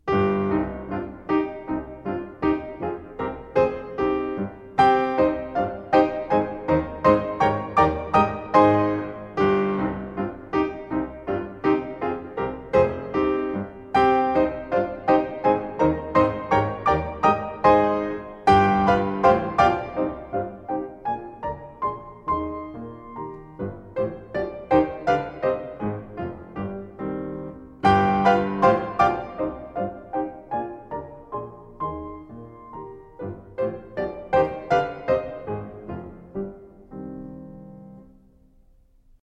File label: Landler in c-moll; Schubert